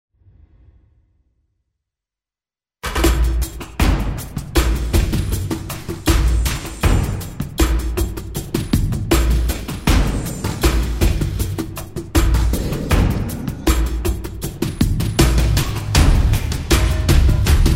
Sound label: BRONX PERCS